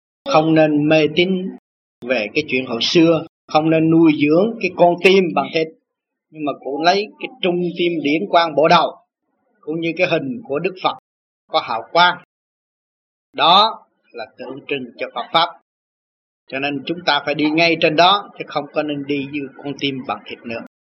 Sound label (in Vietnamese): TAM TAM